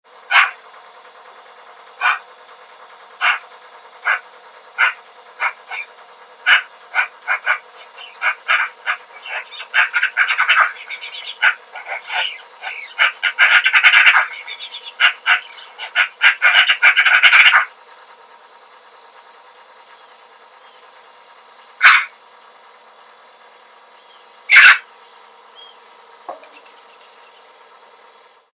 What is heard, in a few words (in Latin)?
Pica pica; SETE